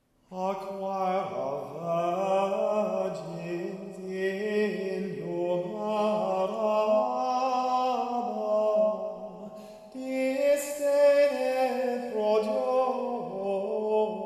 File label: choir